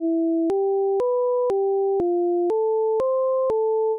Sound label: Playing Bgm; 2d platformer